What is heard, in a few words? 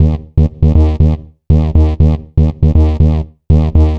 Techno Synth